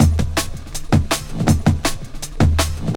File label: Explore 162, breaks; minihalfs